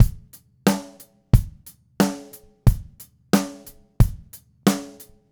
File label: Red Maple Groove 20-inch Kick 90; 2002 DW Red Maple Kit; 90 BPM; Sonic Foundry (Sony Creative Software); Vital Drums